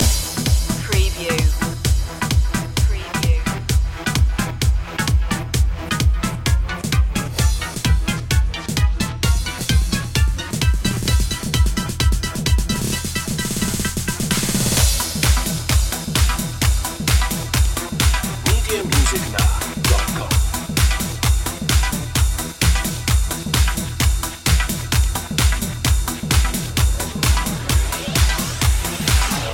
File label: oriental dance loop